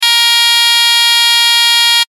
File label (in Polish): ton